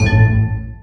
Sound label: Fizz Chain; Candy Crush Soda